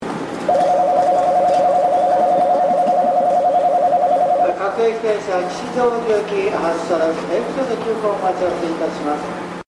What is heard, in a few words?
bell; keio shibuya2; 発車ベル; １番線